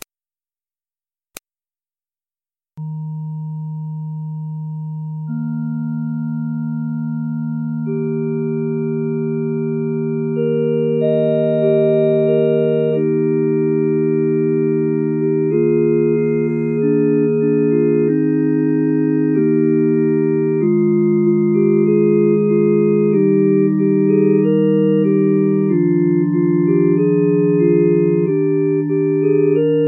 Backing; Still Still Still; Christmas Choirs

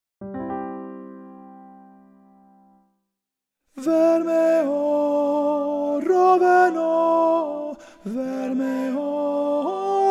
ALTO; Eriskay Love Lilt; church

